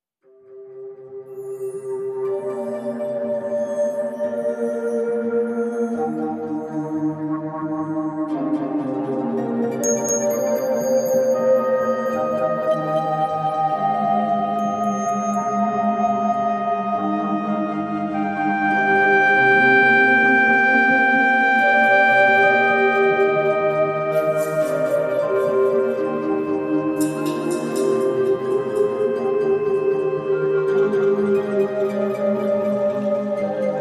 Desert Shimmer

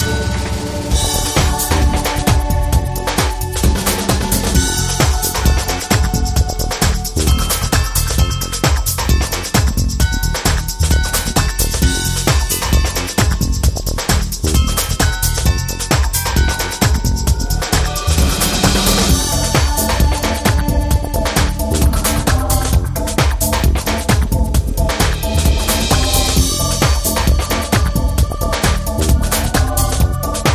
The Sleigh Groove

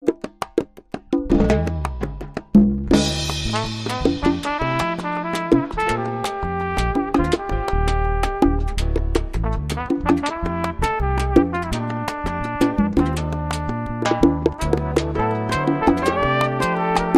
Jazz

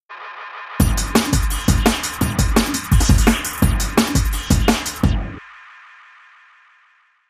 Feedback Drums FX

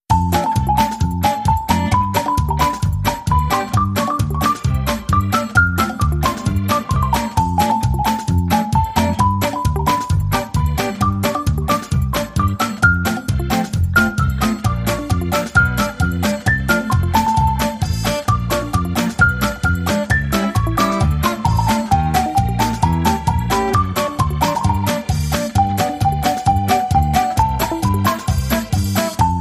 Fast Cartoon

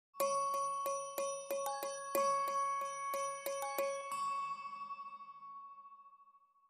Clockwork Waltz, Sting

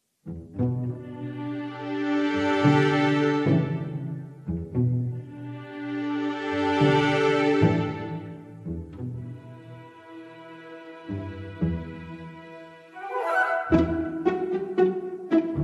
No Percussion

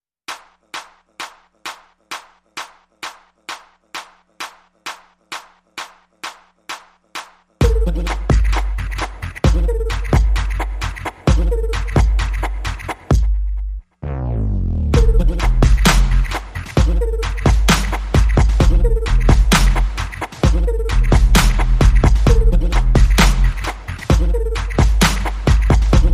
No Synth Lead and No Horns

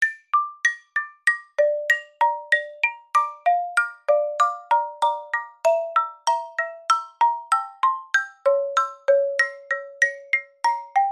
Swirling Marimba

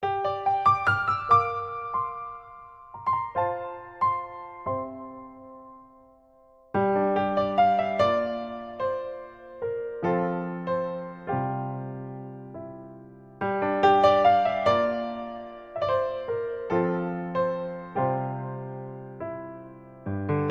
Introspective Piano Etude

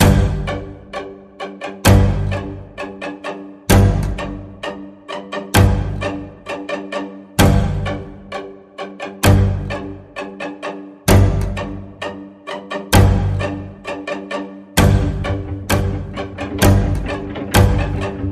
Sinister String Pulse G, Key of G

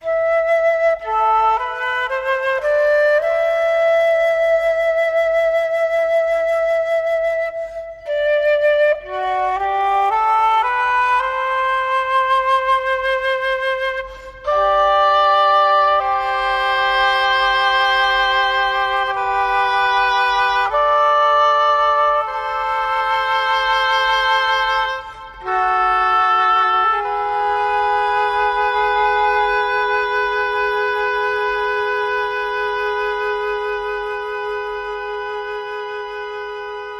Flute and Oboe

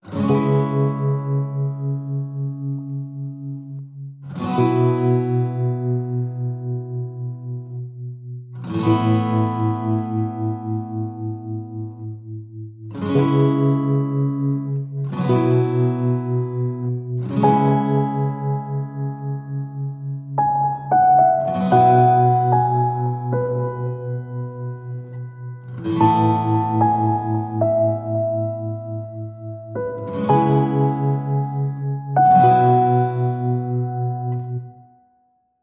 Calm And Serene, No Drums

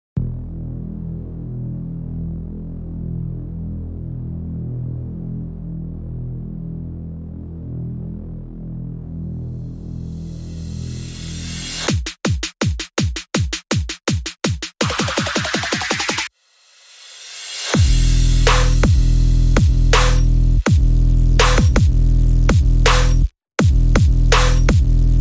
Drums and Bass

